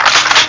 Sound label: gun reload; amigatextureenginedemo